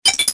button; Soldier Of Fortune II Double Helix; XBOX; movers buttons

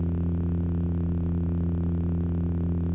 lightsaber hum; weapons

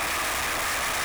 static; Star Wars Knights Of The Old Republic II; XBOX

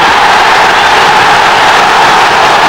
Crowd Goal; David Beckham Soccer; XBOX

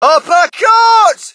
crowd, shout; Mike Tyson Heavyweight Boxing

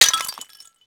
tile hit; projectiles; bullethit; Max Payne

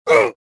choke

